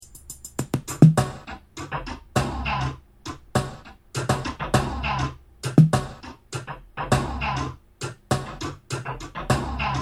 Loop

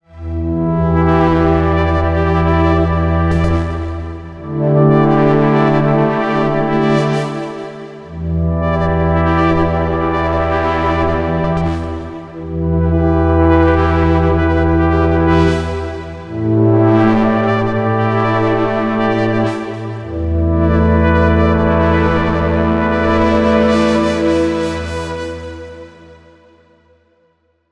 Oberheim OB-E Poly; G-Force Software; Nsytagmus

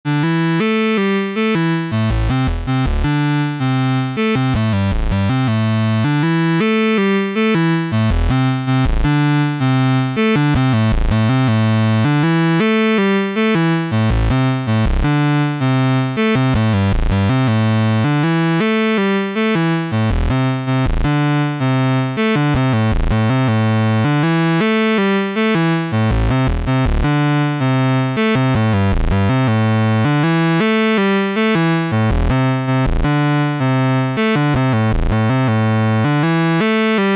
Beepstreet Dagger Drive

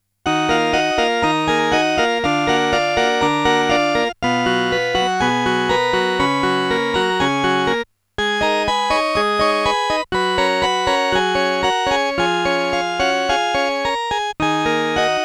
Casio MT 400V Harpsichord Vibraphone

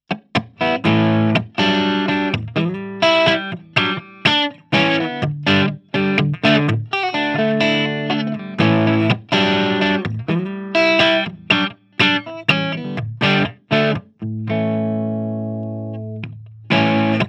Clean 3 Way Switch Neck To Bridge

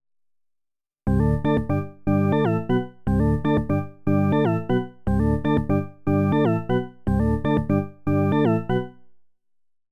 Studio Electronics Quadnic Chord Organ

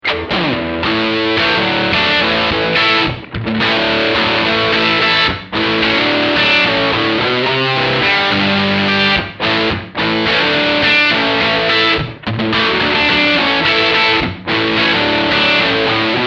Distortion

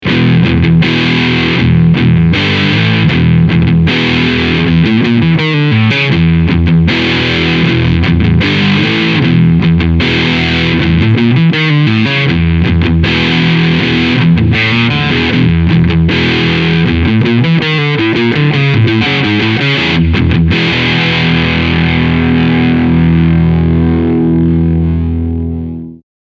Full Gain Mid Scoop

